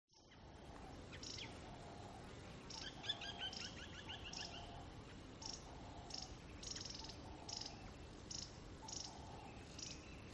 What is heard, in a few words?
ATMO BUSH Water Hole Dusk Birds Insects Chirp; smartsound